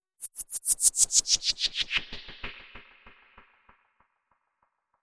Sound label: Iphone 8 White Noise